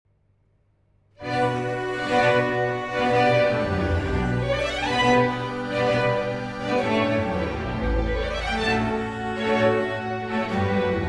Violin Concerto in D Major

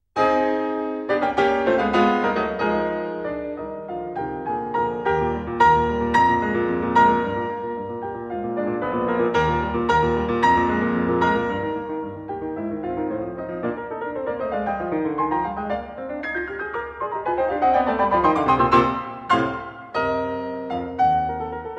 Piano Sonata in E-Flat Major

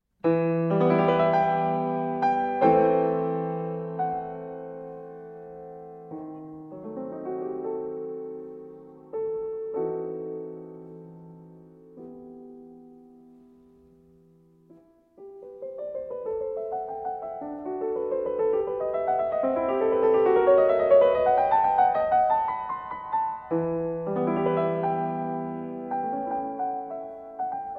Partita in E Minor, Toccata; Partita No. 6; BWV 830 I.

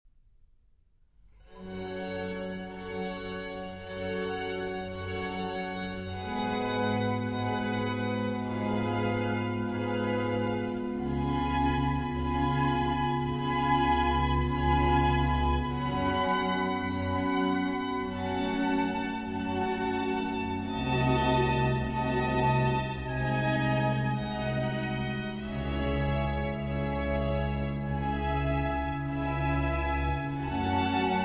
Concerto for Strings and Continuo in A major

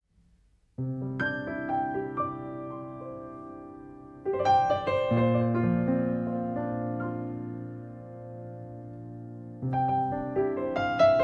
Arr. for Piano

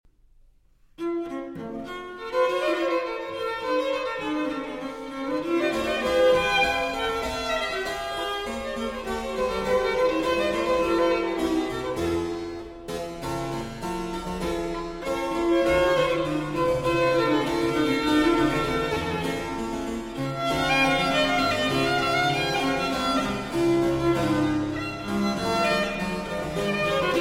Trio Sonata in B Minor, Fugue, Gai

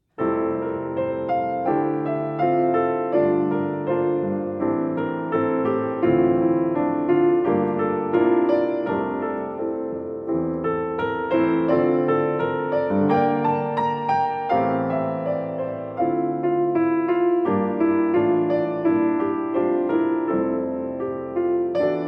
Largo, Violin Sonata in C Major; BWV 1005

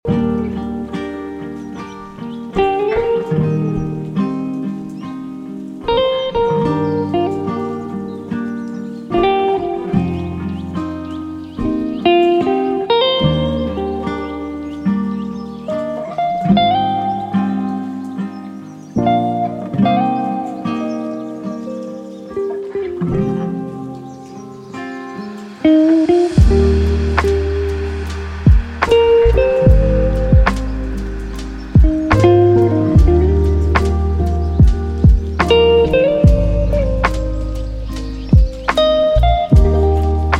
Bluegrass

